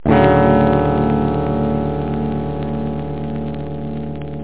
dmaj7, guitar; da capo vol1

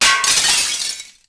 Glass; Urban Chaos

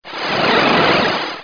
Doom 2 Explosion

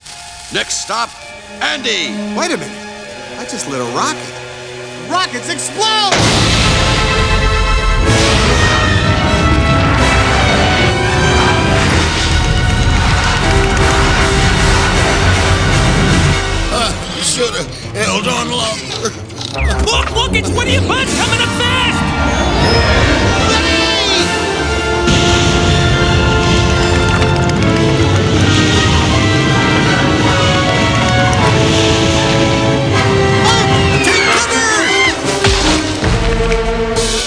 rocket; Toy Story Activity Center (Disney-Pixar)

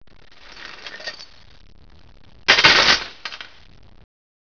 chains

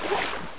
WET FEET; Duke Nukem 3D Kill-A-Ton Collection

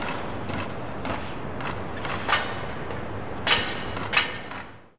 fort; Imperialism Demo

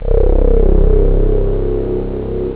heavy, synth; OctaMED SoundStudio V1

